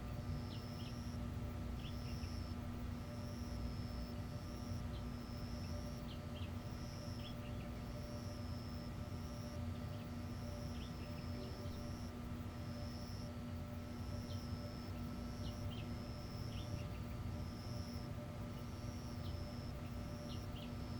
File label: indoor school hall night insects; anemoi